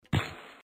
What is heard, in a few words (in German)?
Kiste absetzen Sound; The Dream Machine